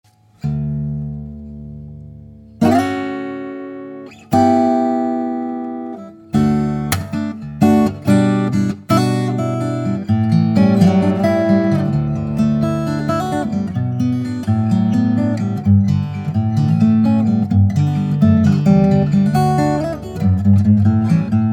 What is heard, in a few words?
Guitar